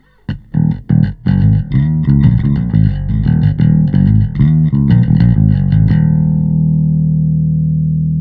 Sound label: Bass; VLA-3A